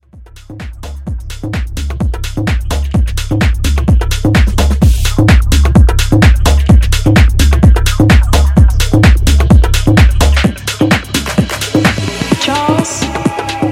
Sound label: Tech House Intro